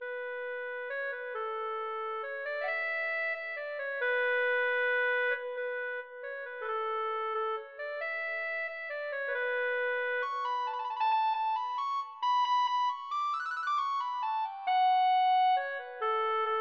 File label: melodica